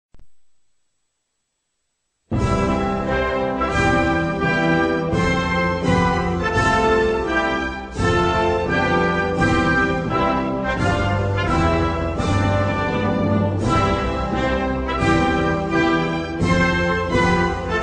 Himno nacional de China Taipei Taiwán National anthem of Chinese Taipei (Taiwan)